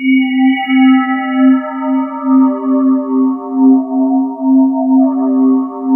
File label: BELL STEE, ATMOSPHERES; Sound Gallery - Charged